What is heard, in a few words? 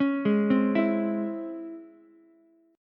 Db Minor Major 7th Ukulele, DbmM7 chord; DbmM7 arpeggiated; JGuitar